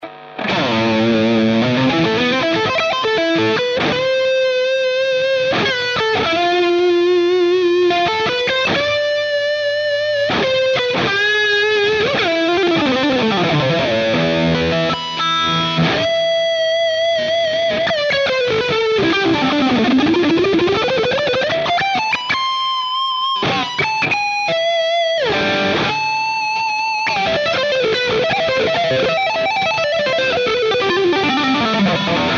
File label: brit JM pre S screamer, PODxt Live